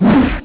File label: battle